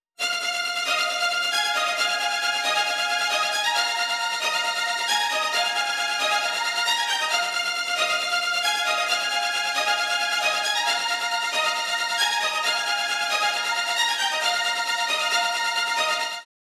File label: strings dry